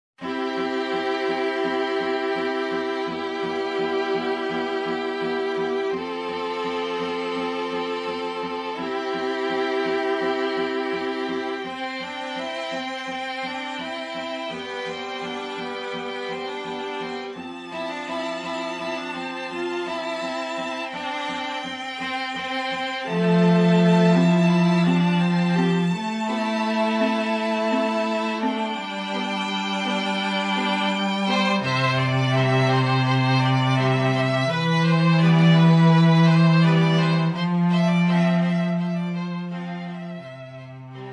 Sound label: STRING QUINTET